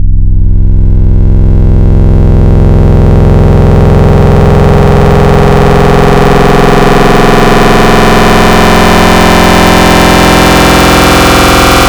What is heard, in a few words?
Metal Vibes, wavetables; Cymatics; Secrets Wavetables; Serum